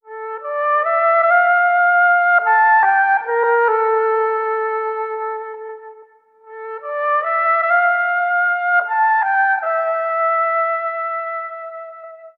flute, Jazzmatazz loops

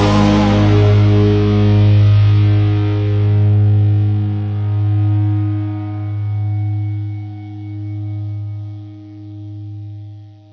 distortion to nothing, Guitar; Notes

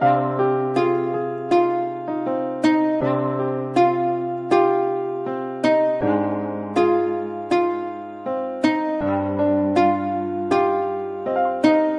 piano, Loops Sad

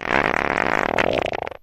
fart long; Farts

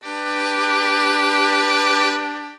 violin; String

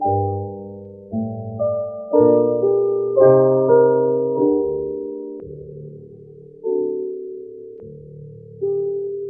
piano, Loops, Sad

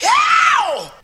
ow

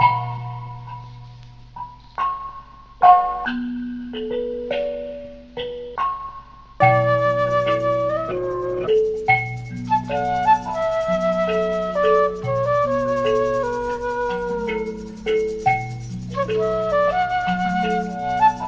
keys japanese warmup

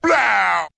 blaow; Voice; ooh ahh

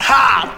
"ha", Voice

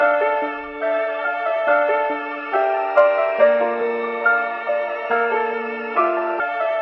piano, Sad

